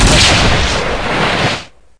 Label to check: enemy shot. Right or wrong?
Right